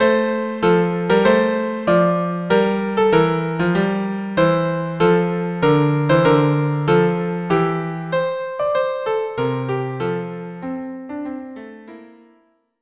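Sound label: violin cello duo; La Doucer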